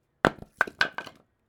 Skittles

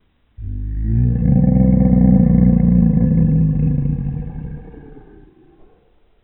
Dragon Growl Omnious

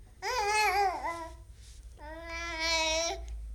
Baby Voice

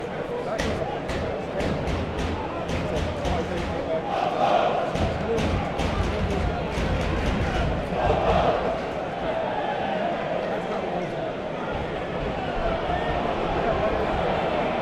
Football Match Chanting Crowd Ambience Stereo

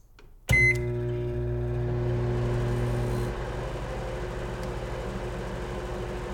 Microwave Start

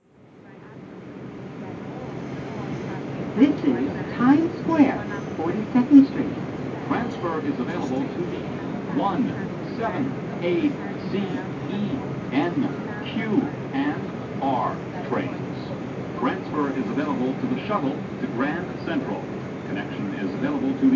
Times Square Subway

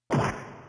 Punch

